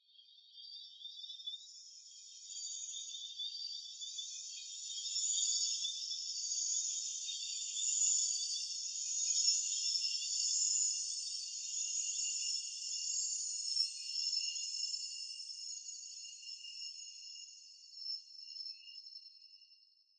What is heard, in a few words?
Bird Solo, Garden